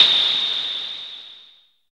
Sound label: JUNO NOISE, JUNO PERC; Drums In Your FACE!